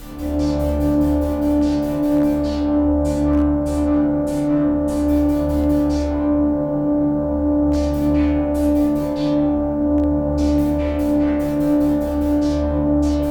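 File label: RHYTHM PAD, AMBIENT PAD; RTHMPAD4 D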